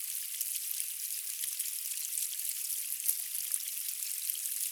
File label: RAIN Suburban Light loop stereo; Cielonos